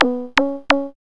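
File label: synth sequence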